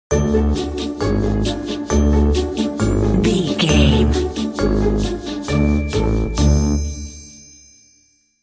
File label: stealthy tiptoeing stinger